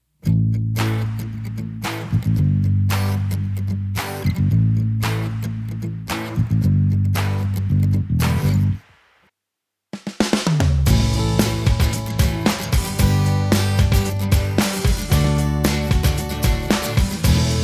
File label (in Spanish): Pista instrumental; Programa Integral SED